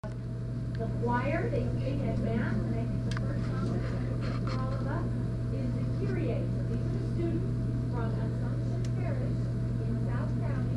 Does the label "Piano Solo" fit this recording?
No